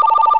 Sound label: Conference Notify